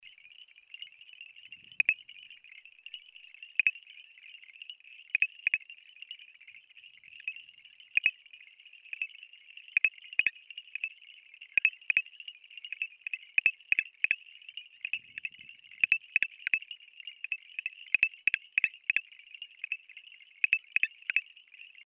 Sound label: boana pulchellus